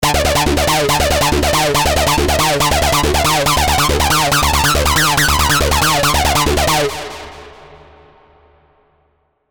Acid, hard techno; Notech; revealed sylenth1 hard techno vol 1

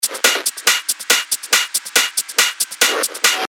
techno loop 140 BPM; Bulldozer; Revealed Techno Top Loops Vol. 9

